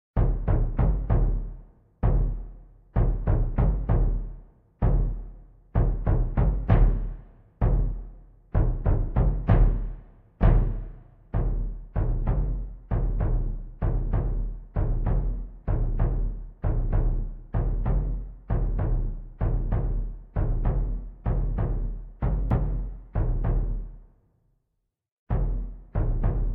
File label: Guitar Drum Track